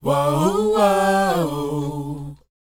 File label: WHOA D, Vocal, R&B & Dance; ILIO; Vocal Planet